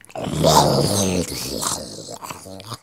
Zombie; Blind Survival

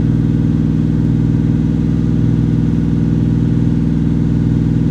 Mustang Loop; Automobiles

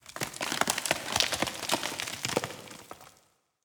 Boulders

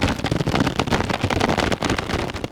Stretch, Foley Creaks; SFX Kit

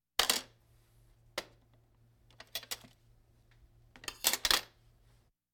Hospital effects

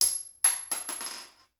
Bullet Shell; Sound Ideas; Guns

